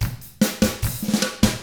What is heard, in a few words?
rock drums